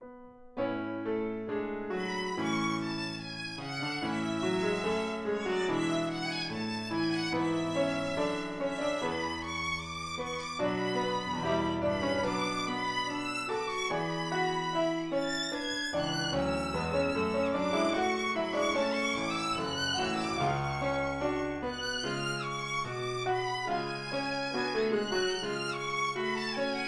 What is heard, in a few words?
Sync Wavetable Soni